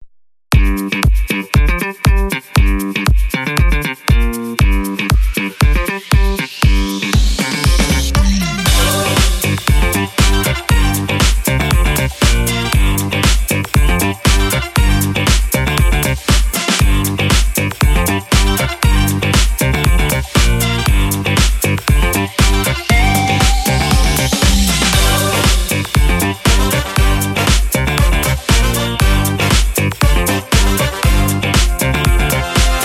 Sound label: Upbeat Funk